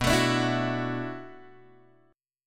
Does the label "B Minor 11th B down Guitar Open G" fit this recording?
Yes